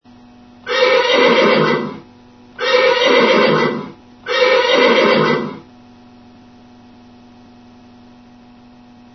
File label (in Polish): koń